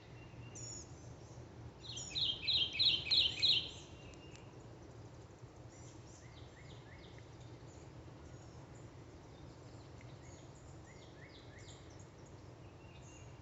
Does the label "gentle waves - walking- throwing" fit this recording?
No